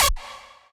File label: lead